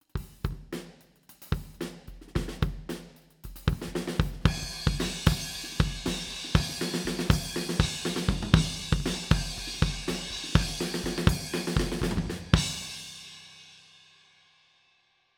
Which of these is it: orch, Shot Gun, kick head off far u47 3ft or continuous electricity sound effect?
kick head off far u47 3ft